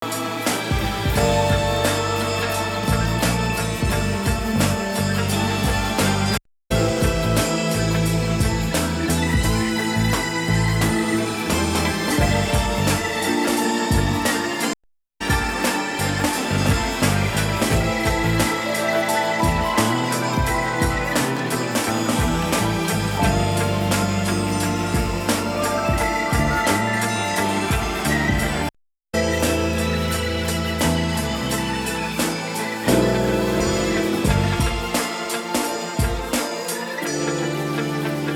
retro soul vibe

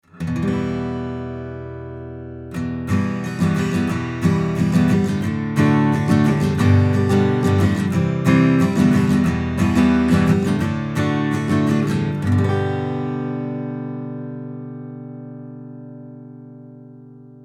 U67 Omni Ac Git Chords; RME UFX

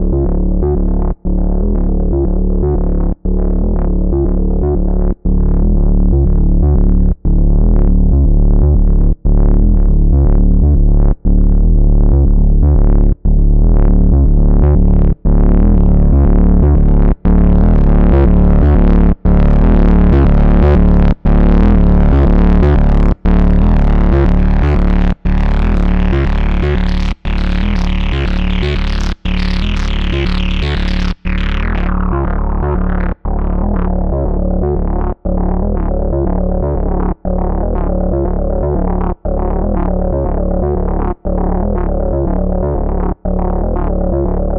Funk 70s Resonance vs Feedback Sequence

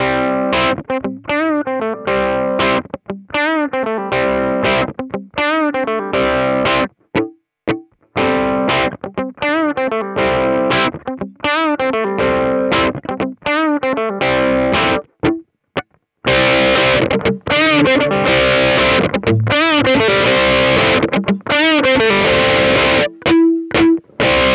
Source Audio EQ 2 Mid Boost

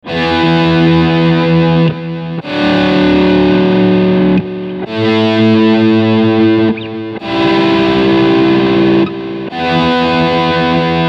Fast Attack Overdrive

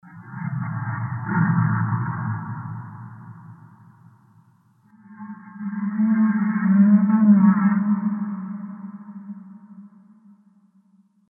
fantasy creatures white mountain gorilla

